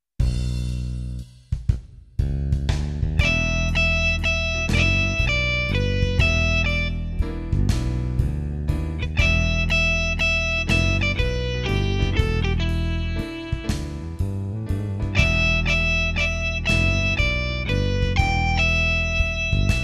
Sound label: guitar blues